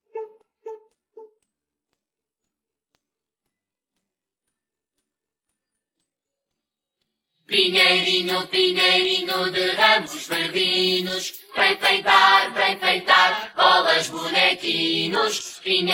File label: vocals